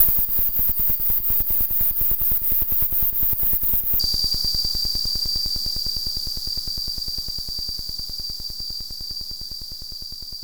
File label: SFX, chillout; Sonic Foundry (Sony Creative Software); Afterhours EDM; Chillout Service